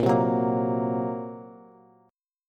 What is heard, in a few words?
Bb Suspended 2nd Sharp 5th Bb Bass; strummed; chord shape; 18,15,16,15